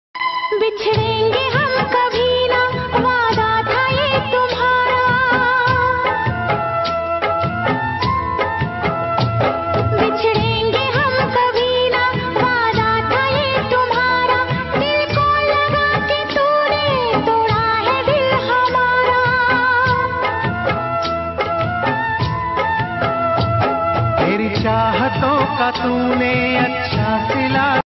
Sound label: sad hindi